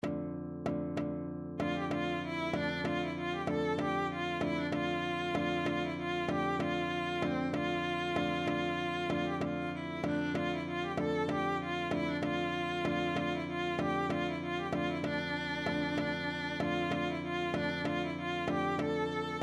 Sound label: Estampie Full